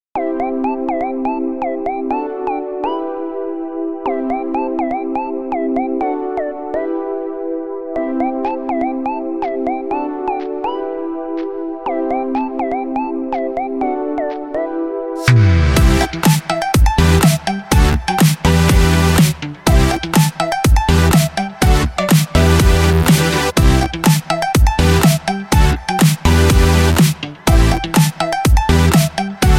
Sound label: Pick Up Travelling Step Music